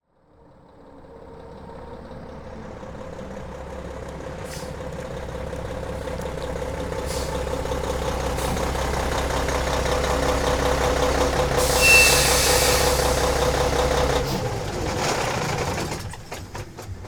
Semi Truck; Large Vehicles; Blastwave

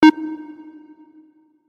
Pong Opponent Bat; Pitch Black Audio Pong

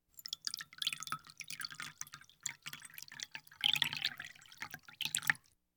Household Phones

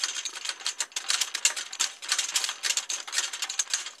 Roll Library Cart Wheel Loop; SFX Kit; Foley - Misc

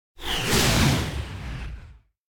Whoosh Hovering Wind Air Movement - High; Hollywood Edge; sound designer's toolkit v2

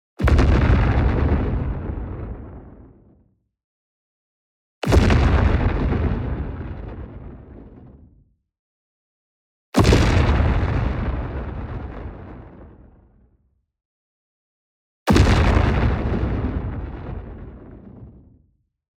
EXPLOSION LARGE Fusion Detonation; boom library; Matter

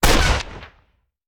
Rifle; Sound Ideas; Guns

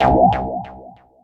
Menu Synth Tone; Menu FX

